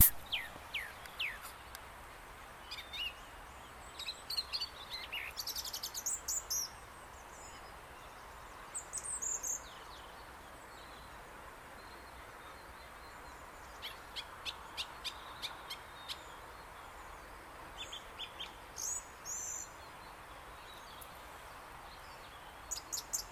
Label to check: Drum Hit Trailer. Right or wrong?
Wrong